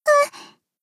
jump; ba miyu

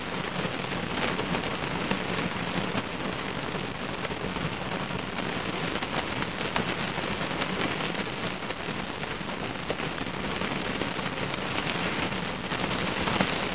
rain on window

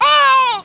pain

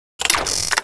undraw, weapons

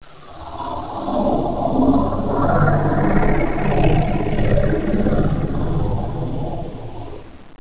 fly by

